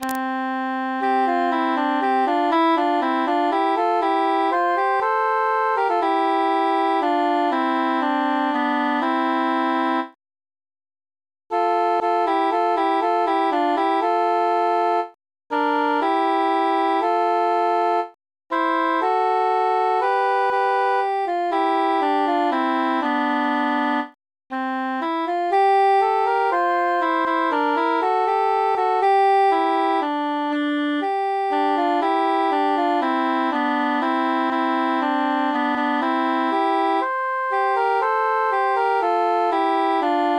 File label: nightingale transposed tenor mute